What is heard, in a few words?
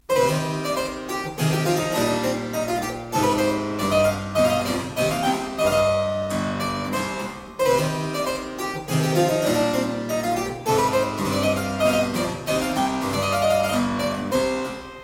Hornpipe; Colin Booth; Henry Purcell